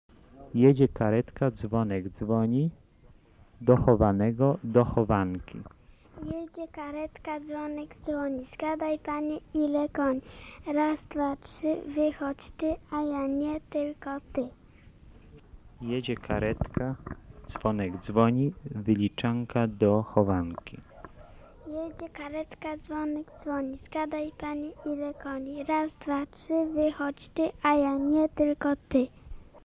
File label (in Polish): jedzie karetka dzwonek dzwoni